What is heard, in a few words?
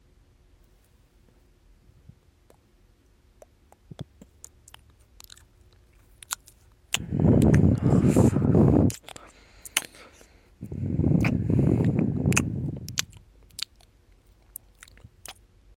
mouth noises